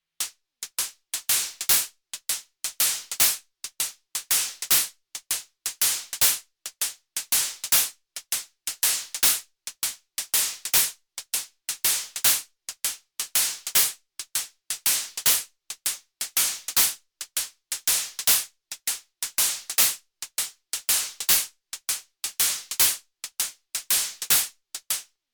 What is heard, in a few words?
Moog DFAM Step Shuffle Hats; 6 Step